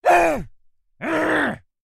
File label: shouty mannn